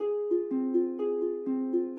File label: Harp